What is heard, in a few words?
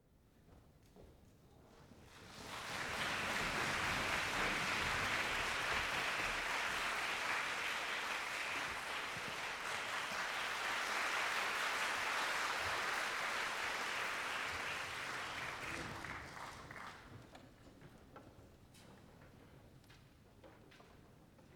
applause